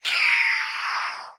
死亡声; 恼鬼

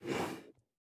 海龟待机声

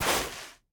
灵魂沙踩踏声

